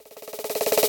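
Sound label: drum fill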